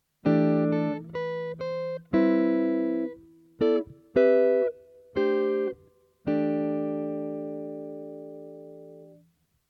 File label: chord melody guitar